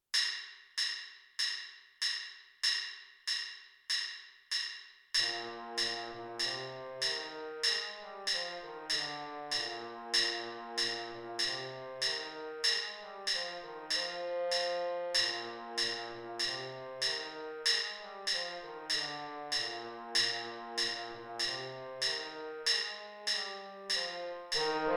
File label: sawmill creek trombone baritone